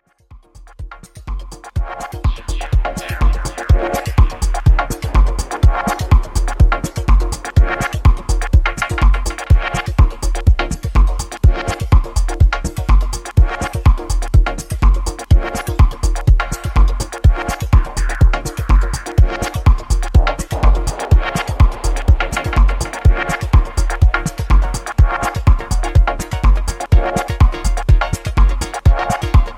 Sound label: electro dub